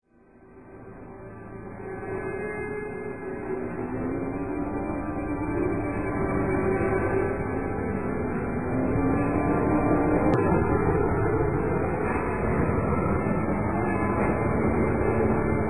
ethereal short, Halloween

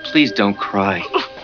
cry; Halloween

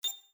Beep; Star Trek

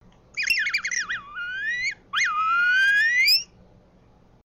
chat, Mouse Droid; Star Wars

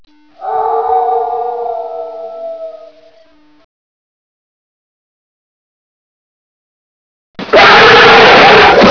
howl attack; Halloween

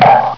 conk; Three Stooges

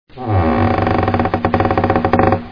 Creaking Door; Halloween

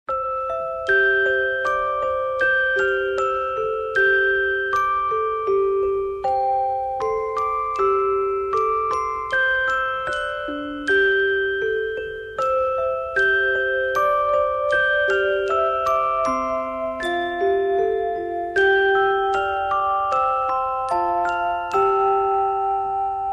Music Box; Halloween

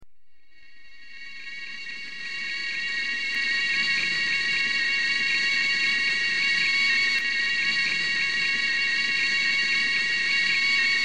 Sine Sound; Outer Limits

